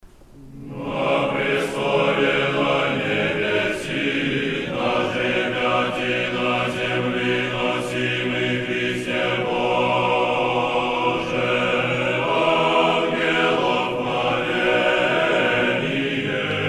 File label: Hor Moskovskogo Sretenskogo monastyrya